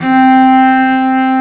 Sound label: CELLO C4